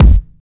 rich mids kick single hit C key, kickdrum sound tuned to the C note